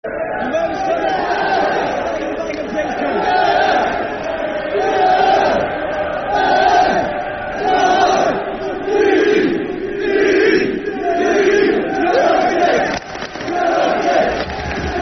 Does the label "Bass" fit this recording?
No